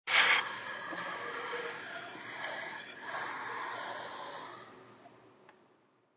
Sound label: Stool Swivel, Swivel sound of wooden bar stool.; Chairs